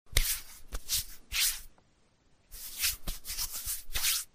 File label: handwipe